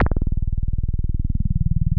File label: TB 303 BASS; BASS SOUNDS